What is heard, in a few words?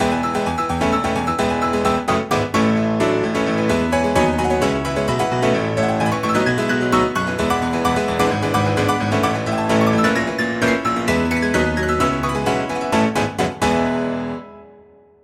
Piano Solo Dry